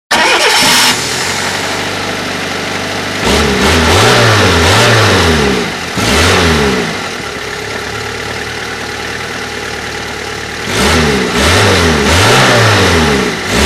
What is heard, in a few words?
Fiat Punto S